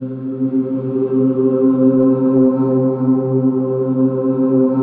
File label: Choirboy, Noise; Cymatics; Shapeshift